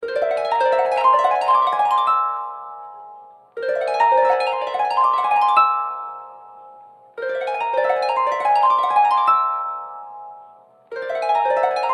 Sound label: Glissando tone, Samsung ringtone